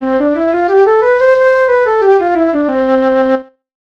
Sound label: tempo 150 percent